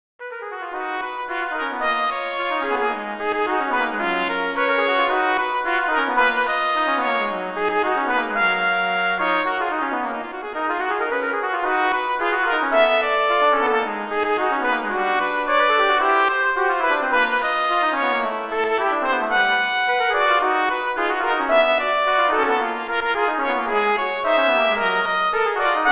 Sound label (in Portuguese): Para tres trompetes Trecho; Extra Ninho